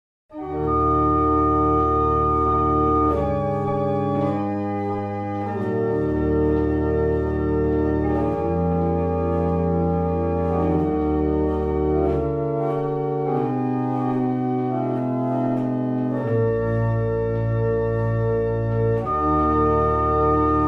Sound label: Double chant, barrel organs; North End